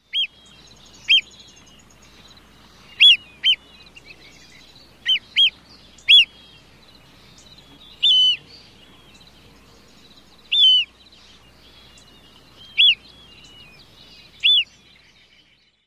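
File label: calls at dusk, bird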